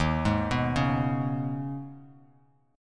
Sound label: D sharp 7th Suspended 4th Sharp 5th D sharp, Bass Standard; arpeggiated; 11,11,9,6; D#7sus4#5; JGuitar